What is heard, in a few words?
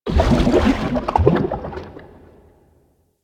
Landscape Coolant Gurgle; ambience; props; one offs; Warframe; Venus Open World